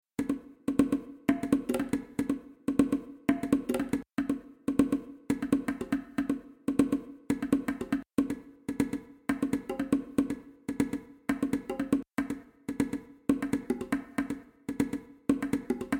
Em 120 funky groove

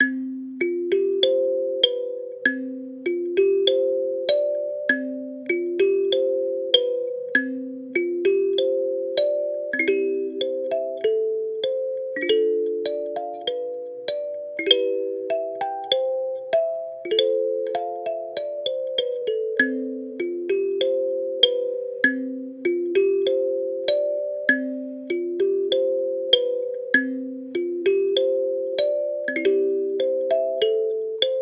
Kalimba; Song for the Whales

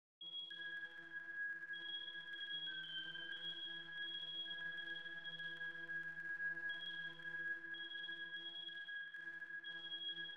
Thunder Rain Inst. Construction Kits

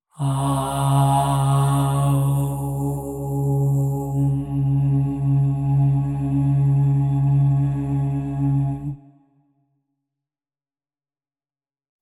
OM 136.1 hz; MixterPlus

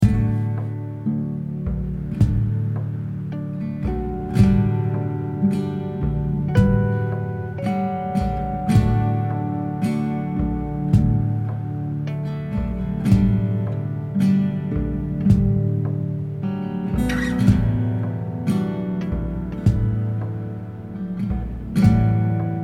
D110 GTR Impro

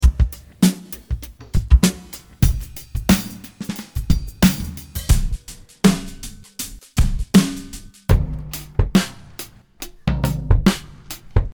Drumloop Kit; ccPlus

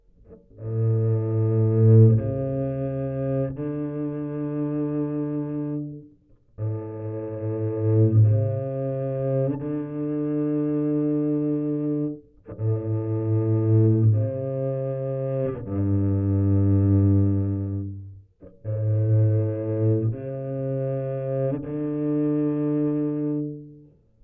bass part

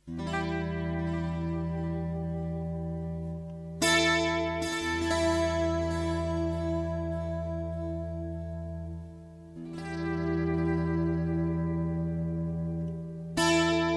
Em100 GTR Vol Ped motif

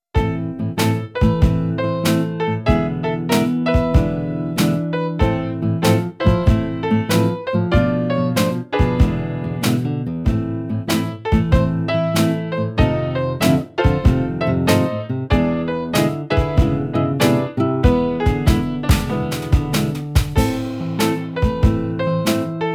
Blues Rag in A minor

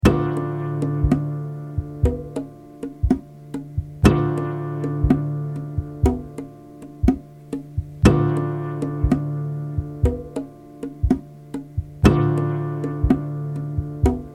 D 120 GTR PERC groove

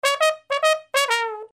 brass loop

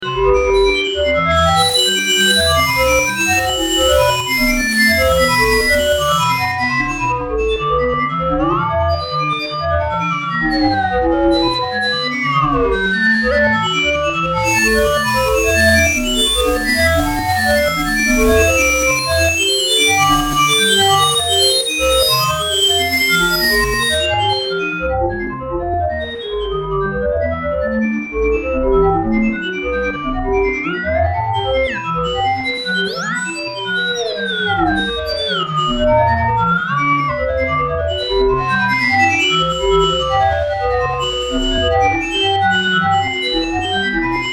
Learning Recorder

